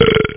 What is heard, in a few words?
belch